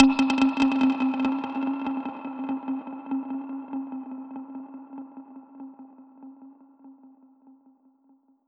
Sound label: Perc Hit, dub percussion; musicradar